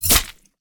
HORROR - DESIGNED - stab medium; Game Audio Factory; GAFSFX01 - Dark Materials

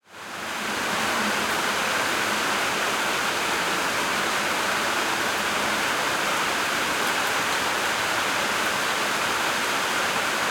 Rain Heavy; Soundopolis; Natures Fury

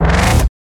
transition hard; Alexander Kopeikin; Transitions HD

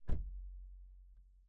Alfa Romeo, Giulia, Door, Interior; Quadrifoglio 2015; DPA4021; Pole Position

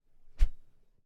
gore - metal knife swing; Timothy McHugh; Gorification [HD]

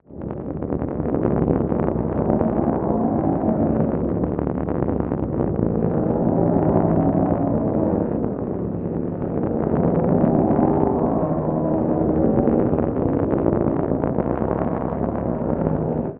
Texture Interference Grainy Lapsteel Pick Ups; Soundopolis; Sci-Fi Electric

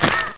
pain, player; hl2

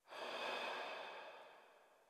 Breathe, Sci Fi; c2caudio; Sci Fi Audio Samples

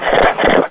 bullsquid snort; hl2

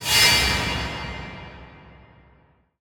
hit impact, Cinematic Trailer; Bluezone Corporation; Cinematic Trailer Sound Effects

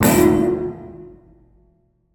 Upright piano Fx Hit Atmospheric Tension; MatiasMacSD

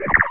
roller idle; hl2

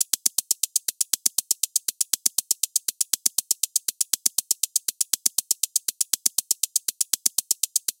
Clock, Effective Trailer Clocks; Federico Soler Fernández

